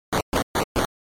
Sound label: NES RPG Enter